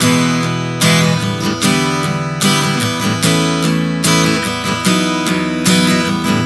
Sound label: Progression 074 E-G-A-B, Acoustic Guitar, Chord Progressions 074 bpm; Sonic Foundry; Songwriter Acoustic Guitar Companion